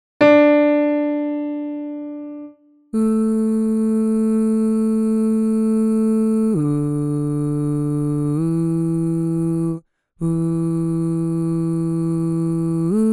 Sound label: Bass Alone; holiday music